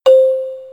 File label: send, chat